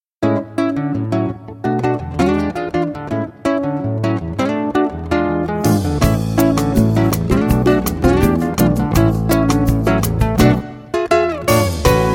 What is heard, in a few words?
bossa nova